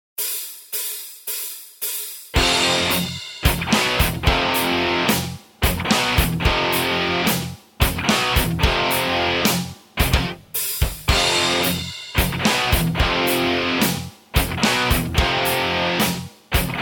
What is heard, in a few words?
bass metal, backing tracks